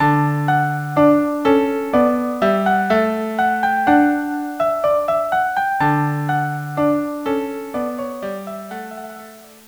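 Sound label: March D violin viola; jacquet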